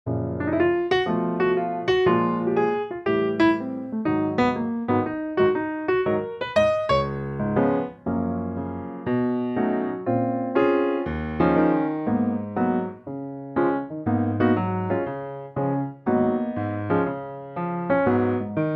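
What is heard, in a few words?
Gb piano, male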